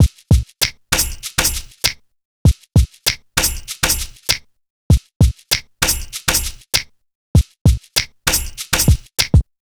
E Coast HipHop Drums

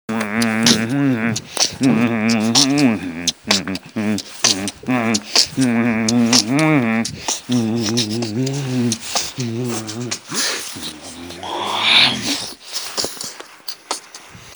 Beatbox

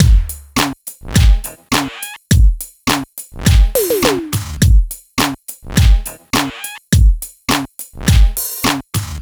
104 Pop Drums; Pop Rock; Moderate Tempo; Pro Tools Essential Loops; Digidesign

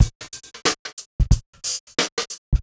HipHop Live Drums Efx; Digidesign; Pro Tools; Pro Tools Essential Loops

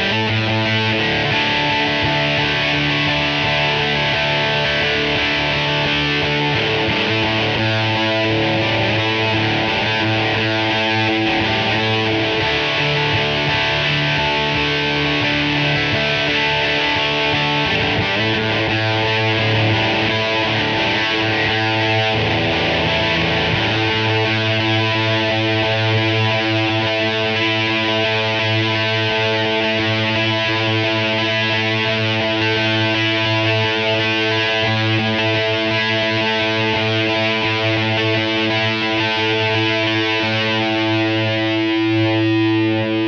dirty gtr; Pro Tools Essential Demos; Digidesign

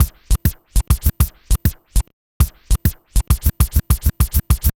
Vinyl Scratch Kick

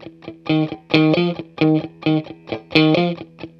133 Pop Guitar S-Note F maj, Electric; Pop Rock; Electric 100-160 bpm; Pro Tools Essential Loops; Digidesign

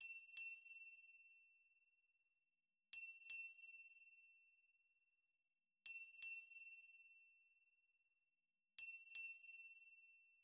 Hip Hop Triangle raw; Digidesign; Pro Tools; Pro Tools Essential Demos; Essential Loop Demos; Demo Session - Hip Hop

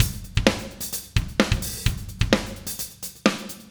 129 16Th Rock Drums Verse, Fast Tempo; Pop Rock; Digidesign; Pro Tools; Pro Tools Essential Loops

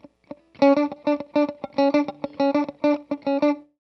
102 Pop Guitar S-Note D min, Electric; Pop Rock; Electric 100-160 bpm; Pro Tools Essential Loops; Digidesign

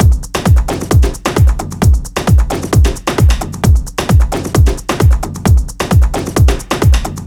132 House Drums No Clap; Dance DJ; House Beats; Pro Tools Essential Loops; Digidesign

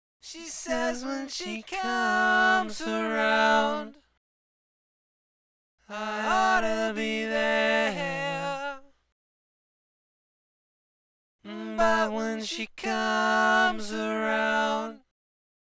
BGV; Pro Tools Essential Demos; Digidesign

